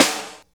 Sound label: SNR, Tekno Industrial; East West